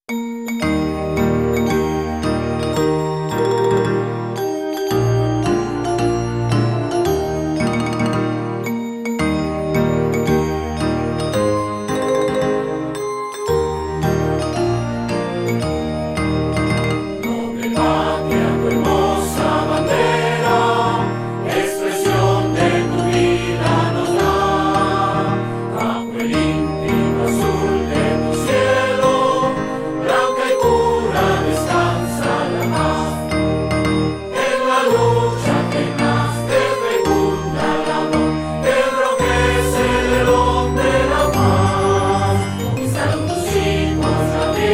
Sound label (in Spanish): himno nacional